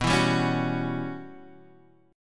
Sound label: E Minor 6th Add 9th B chord